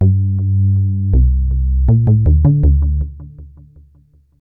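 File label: synth bass, ambient, 160, C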